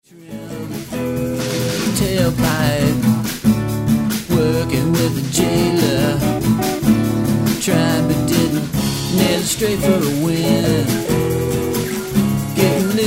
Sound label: tailpipe; galveston